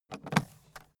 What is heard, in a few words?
aluminium case open